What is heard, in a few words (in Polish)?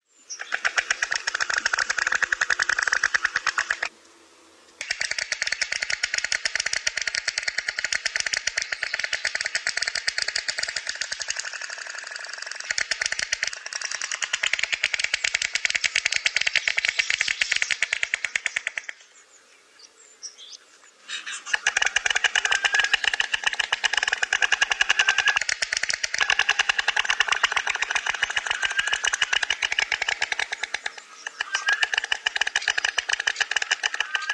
Nagranie odgłosów ptaków na łące